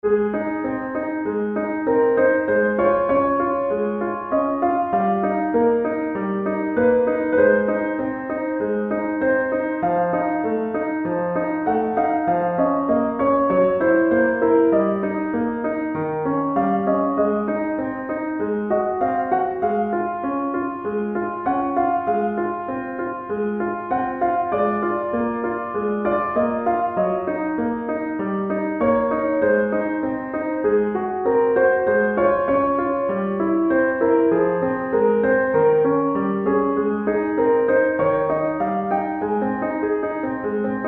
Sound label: Synth; Fuer Emilia